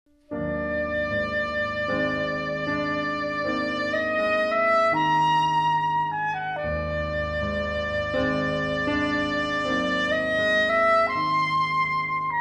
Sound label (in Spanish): Sonido de saxofon soprano; Instrumentos de viento; Viento-madera; saxofones